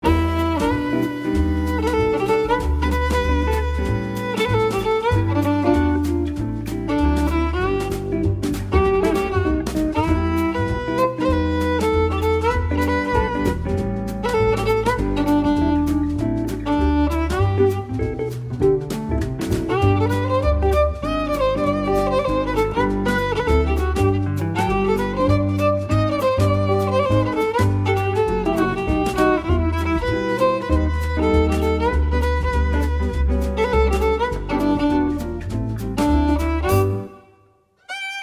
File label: jazz quartet